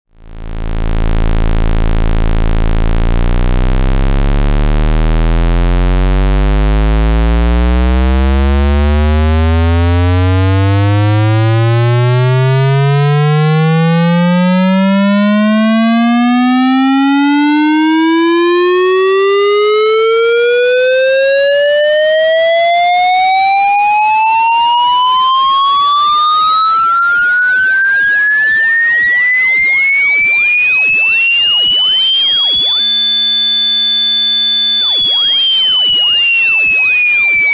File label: SQUARE